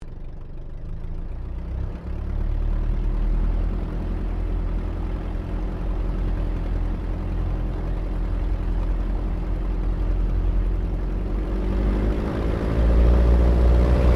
AIRCRAFT DH82A DEHAVILAND TIGERMOTH TAKEOFF INTERIOR; RAAF Tigermoth; Airplanes; Vehicles